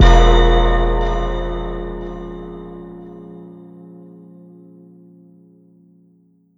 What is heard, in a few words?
Power bell